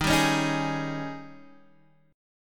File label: D sharp 11th D sharp; strummed; x,6,5,6,4,4; JGuitar